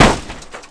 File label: hit sign; Tony Hawk's Project 8; XBOX